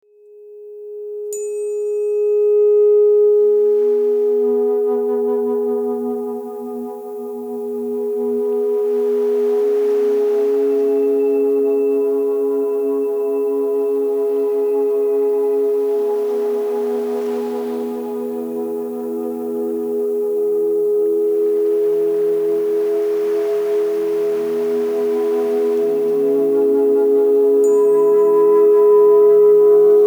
417 Hz Transformation

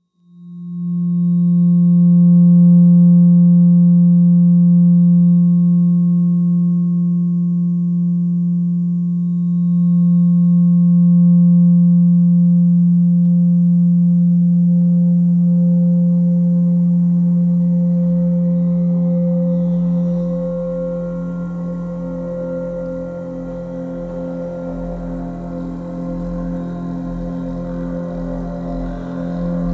174 Hz

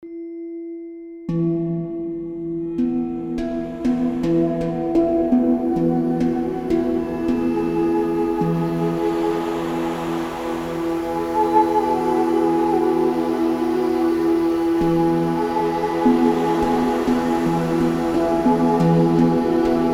Soft Waves Of I Am Frequency

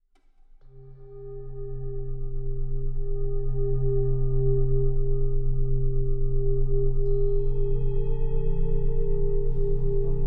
20 Hz Rife Frequency; Cure All

